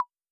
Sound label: button click